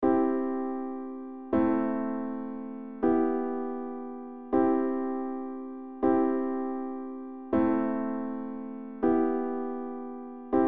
I VIm IIIm I; Les bases harmonie; Progression Accords

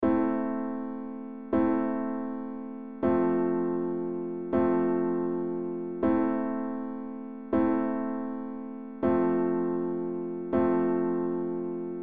Im Im VI VI; Les bases harmonie; Progression Accords

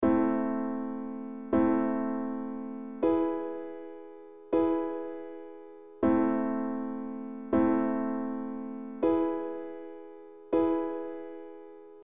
Im Im V V, Progression Accords; Les bases harmonie

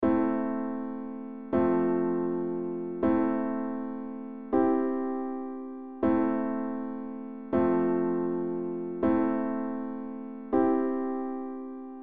Im VI Im III; Les bases harmonie; Progression Accords

